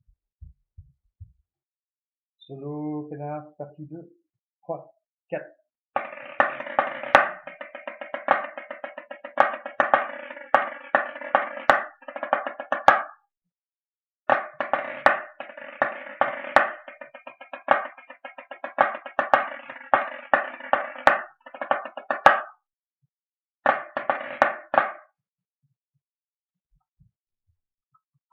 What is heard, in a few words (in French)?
SOLO BAGADIG